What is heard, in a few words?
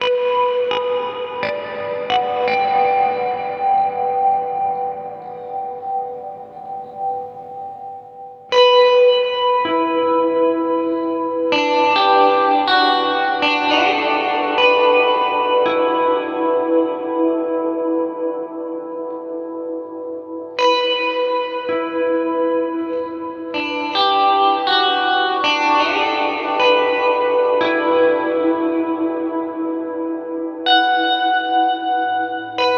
tetraplopia circle, doubler; Four-voice stereo doubler.